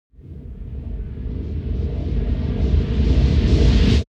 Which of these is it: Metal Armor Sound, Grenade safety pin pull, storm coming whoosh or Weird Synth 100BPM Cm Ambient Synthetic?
storm coming whoosh